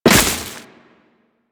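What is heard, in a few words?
Crewman Step Vegetation, Footsteps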